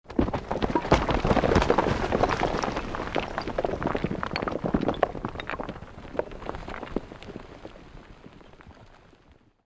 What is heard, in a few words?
created rockslide medium, Created Rockslides or avalanches; Rock Sound Effects; Materials